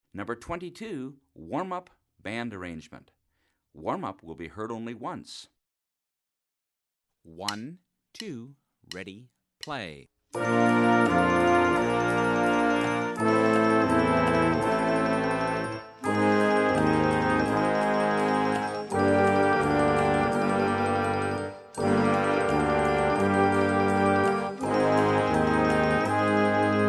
warm up

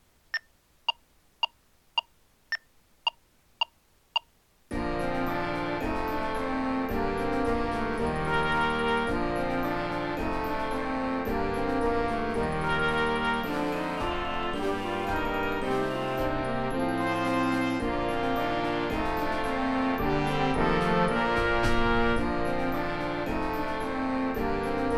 wash my hands click

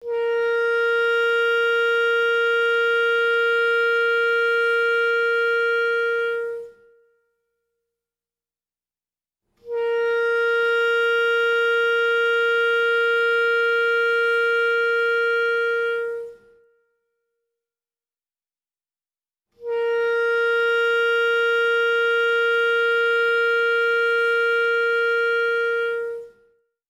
tuning note

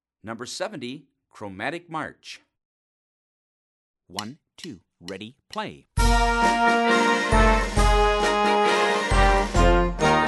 chromatic march

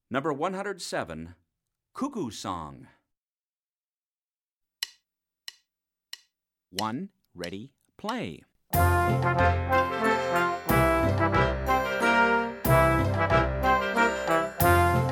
cuckoo song

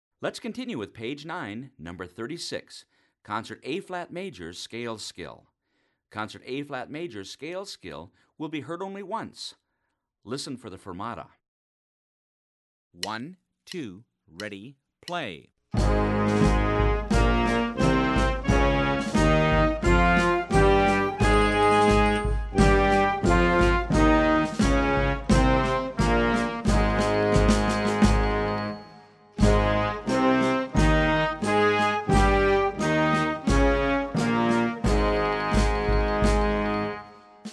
scale concert

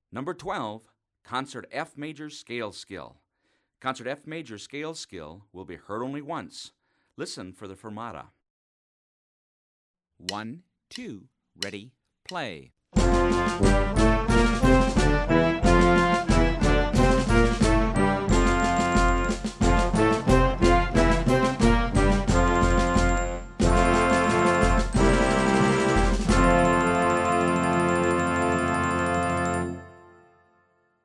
scale

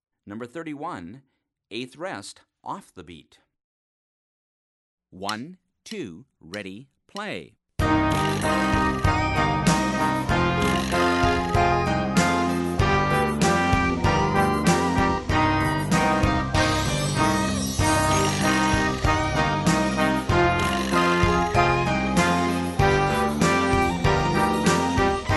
eighth rest off the beat

